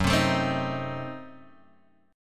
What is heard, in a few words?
Gb-Diminished 7th-Gb-down-Guitar-Open F; strummed; JGuitar